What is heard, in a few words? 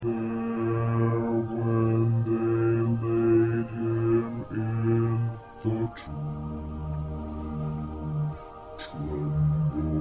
Bass 2